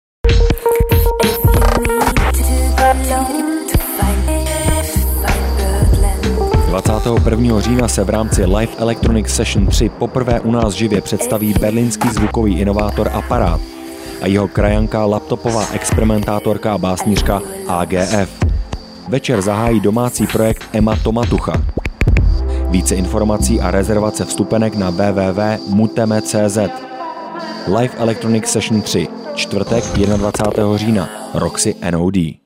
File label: live electronic session